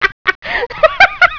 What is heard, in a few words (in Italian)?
suonerie persone risate